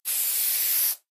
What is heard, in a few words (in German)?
waffen spray; minecraft